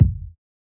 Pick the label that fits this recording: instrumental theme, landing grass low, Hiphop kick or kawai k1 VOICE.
Hiphop kick